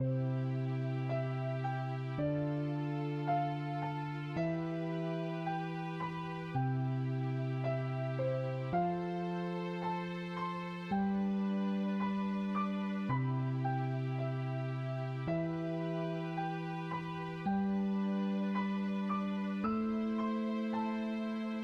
NoDram

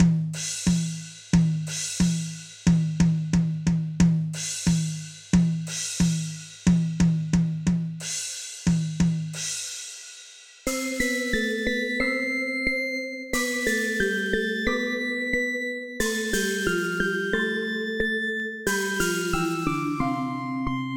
Bell

